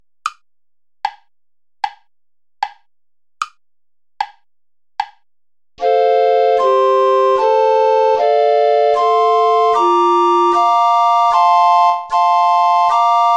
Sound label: play along without bass recorder